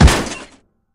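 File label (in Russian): металлический звонкий лязг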